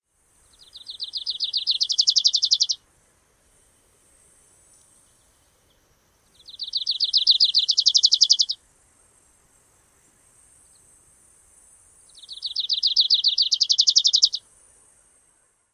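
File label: songs, Grace's Warbler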